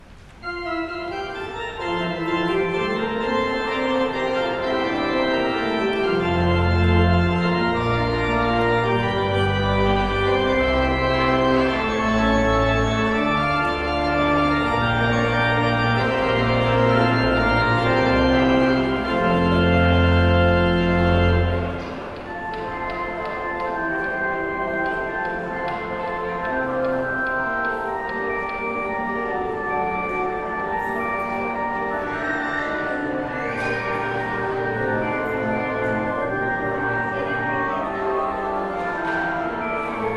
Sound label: Postlude Prelude in F major; Bach